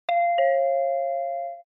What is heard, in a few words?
Ticket Gate Alarm Timbre2